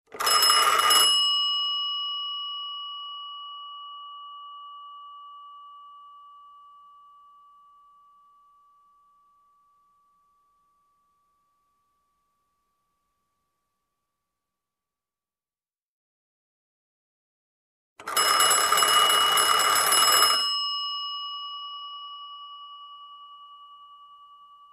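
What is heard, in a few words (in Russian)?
zvuk zvonka starinnogo telefona, sound of an old telephone ringing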